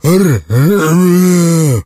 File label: zombie attack; STALKER; customnpcs